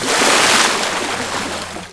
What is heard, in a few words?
Dive Out; Serious Sam II; Player